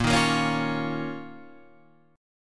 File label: D sharp 7th Suspended 2nd A sharp